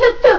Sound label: TOOT TOOT